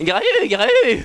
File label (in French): Grahu, Ce borborygme; Ce borborygme a deux sources. A la fois le cri du tigre jamaïcain